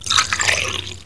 POURING, HOUSE

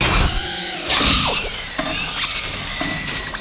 REPAIR

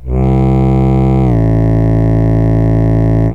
FOGHORN

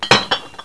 DROP BOT

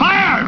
FIRE

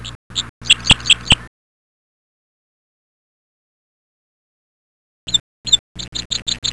CHIRPS

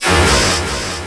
plate

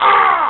ARGH; HOP AND HURL

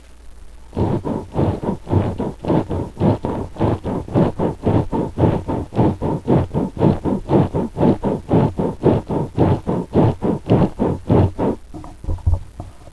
SAW

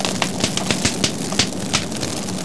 CRACKLNG; ENVIRON

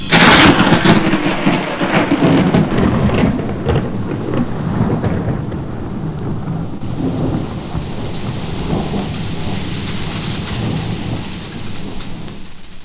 THUNDER, NATURE

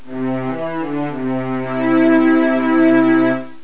STRING

